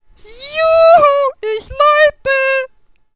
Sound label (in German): ich läute